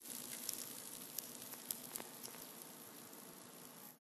static; Mini Games; Five Nights at Ronald's

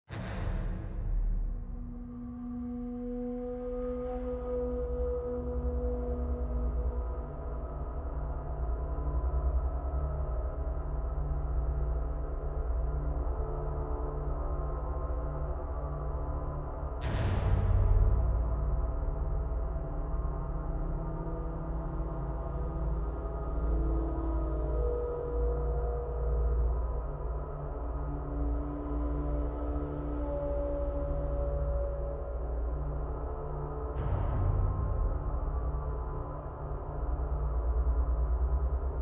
String

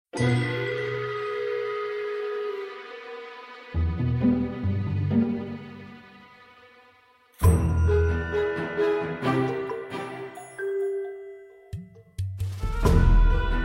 Typewriter dance; Broadsword: Age of Chivalry

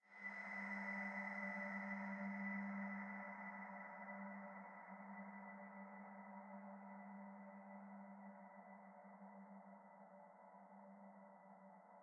Desert Music Stepping Stones Decays G2

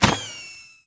Special Double Cross Bow; LooterKings

